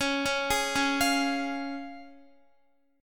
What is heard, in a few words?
C sharp Major, Guitar; arpeggiated; JGuitar